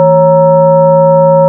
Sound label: fm bell